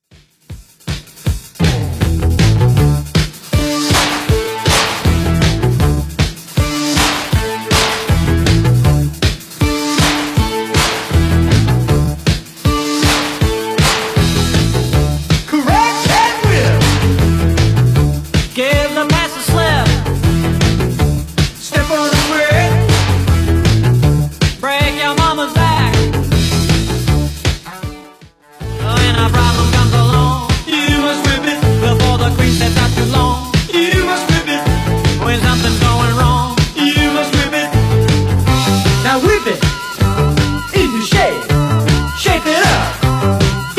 80s DJ Friendly Redrum 158bpm

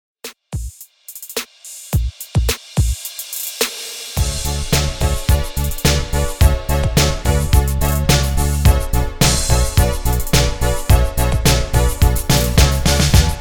REDRUM INST 107 BPM

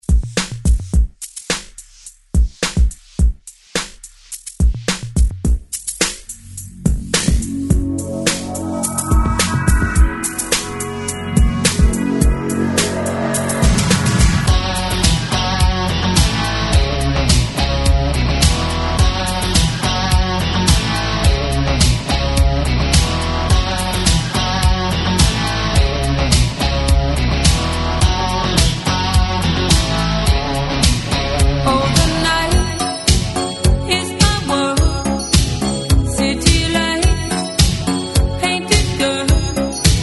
80s ReDrum 106 Bpm

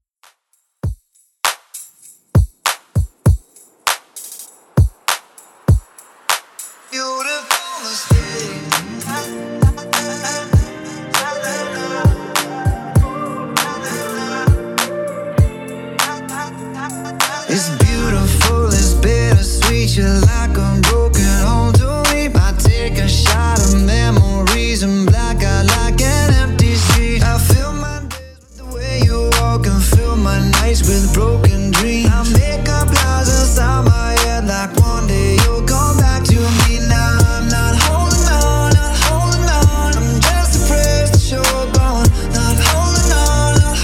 Top40 Mixshow Redrum 99 bpm Clean

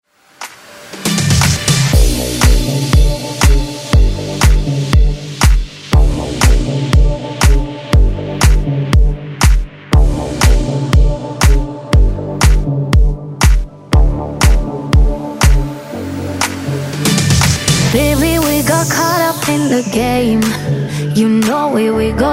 Dance Redrum

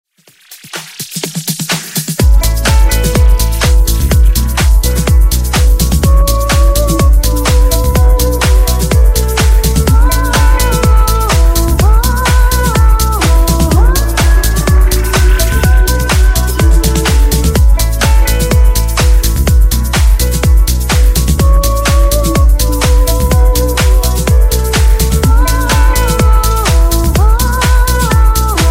Funk Bootleg 125Bpm

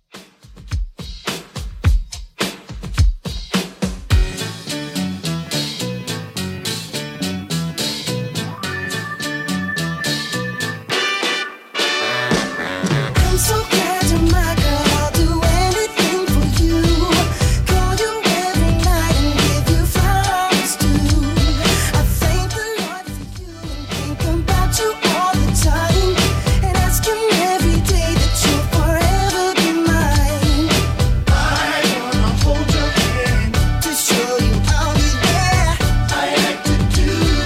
90s Redrum 106bpm

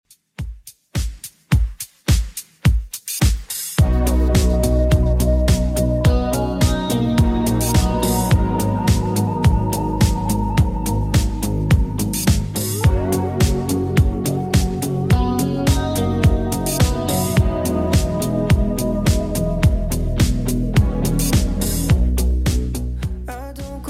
Top40 Redrum, 106 bpm

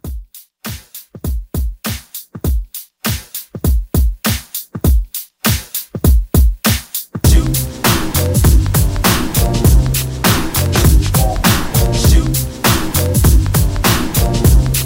90s Old School Re Drum

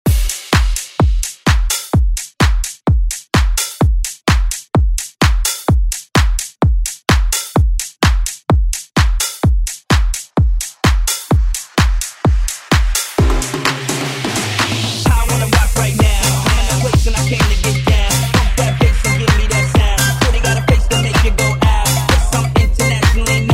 Party Hype Redrum; Deejay Hit; remixplanet

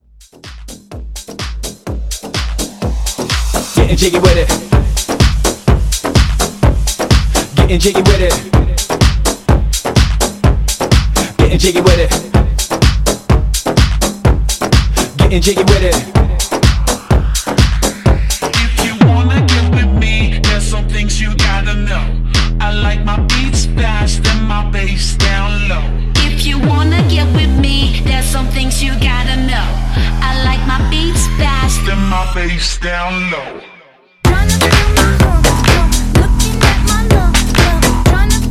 Partybreak Redrum Intro

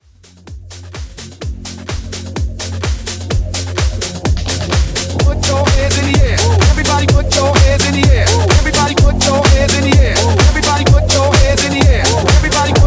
Club House 127

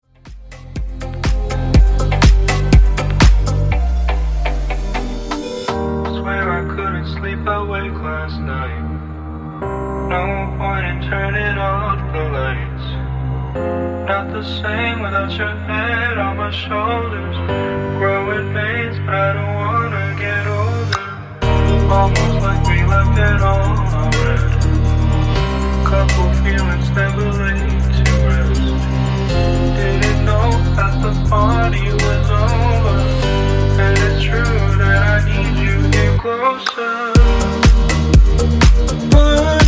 Top40; Club Mashup

